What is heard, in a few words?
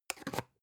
spice jar big scratch close